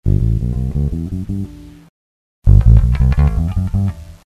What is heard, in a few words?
bass booster finger G maj